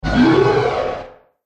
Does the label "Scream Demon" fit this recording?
Yes